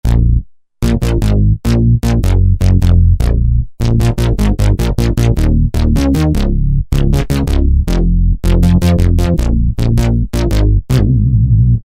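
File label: Synthboy, synth bass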